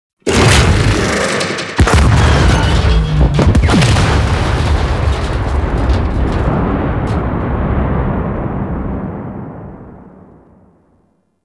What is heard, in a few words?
Thumper Jump And Explode; Cinematics; Grineer Eidolon Shotgun